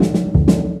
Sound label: Drum Roll; Zero-G Jungle Warfare 1, 2 & 3